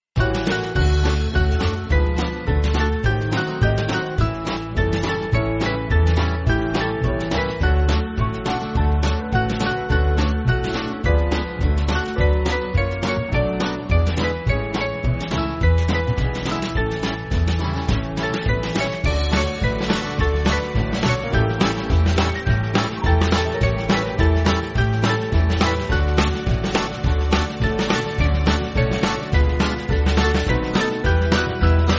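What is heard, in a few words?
Band, Music Backing Files for Churches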